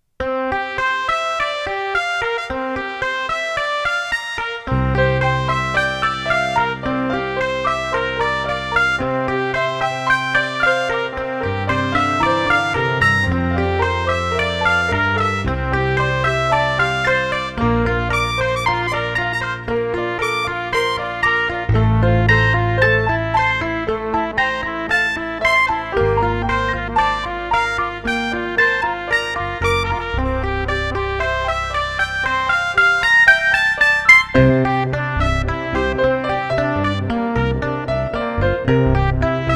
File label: fanfare